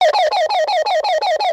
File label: Fire Pre Alert; Lanco